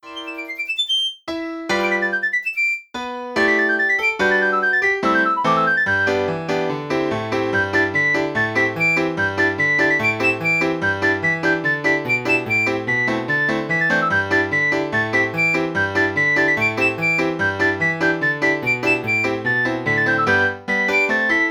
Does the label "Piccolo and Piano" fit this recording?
Yes